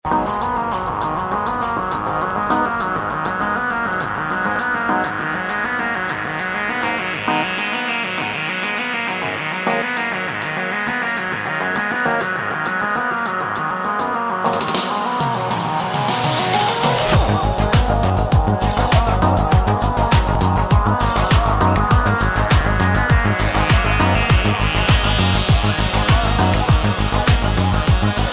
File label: Psy Trance